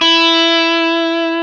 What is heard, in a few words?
GTR Tube, Dirty; Club-50 - Foundations Roland